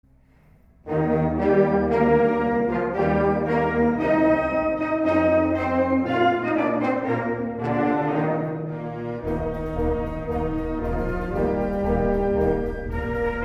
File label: brassband